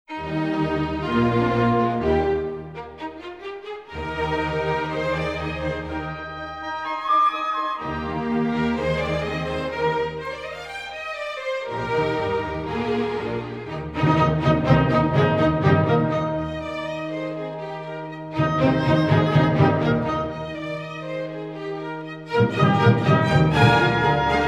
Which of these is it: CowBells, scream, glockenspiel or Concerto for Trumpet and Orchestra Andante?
Concerto for Trumpet and Orchestra Andante